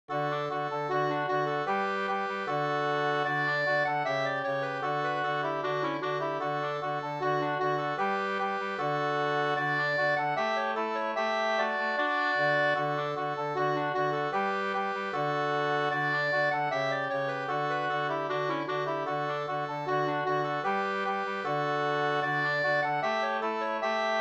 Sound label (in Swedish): brudmarsch Ridmarsch